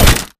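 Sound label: impact bullet light ballistic android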